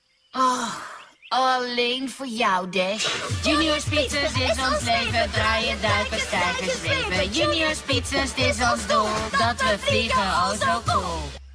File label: Chant, Dutch; Junior Speedsters Chant; Season 1